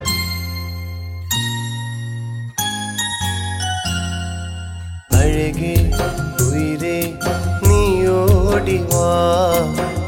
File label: Sad Malayalam